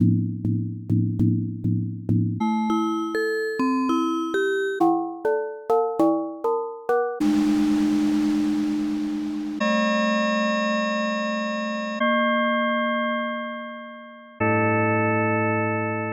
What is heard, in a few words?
synth perc; plugins